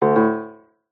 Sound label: multimedia notification tone piano prompt; zapsplat